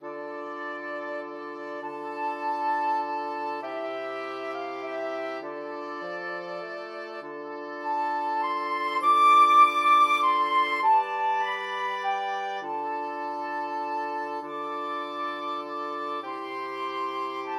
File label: flexible mixed ensemble 4 players